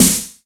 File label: SD, Yamaha RY-10, Drums